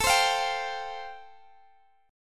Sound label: A Minor 7th Sharp 5th A Guitar DADGAD, chord; Am7#5; strummed; chordshape; x,x,19,17,20,17